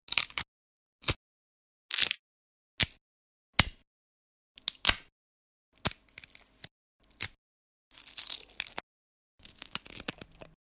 Wood Splinter, Sound of wood being splintered and snapped.; Materials